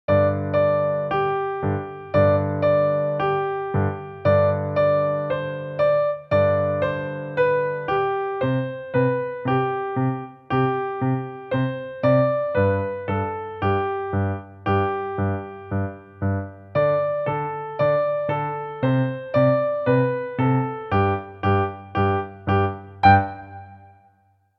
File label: ROCK BAND